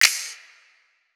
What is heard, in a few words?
Snap